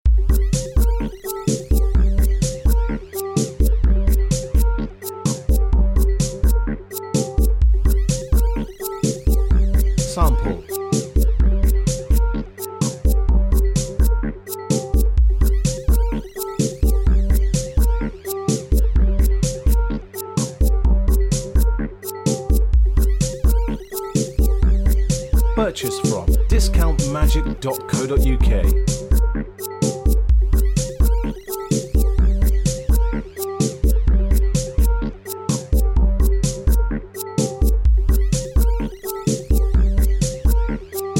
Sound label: electro, backing